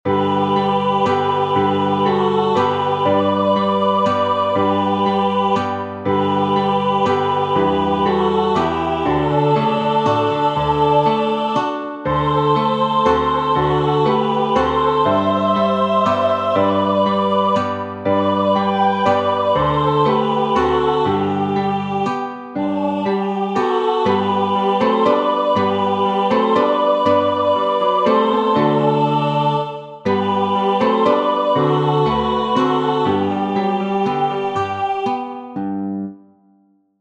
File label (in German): Kuhreihen, alphorns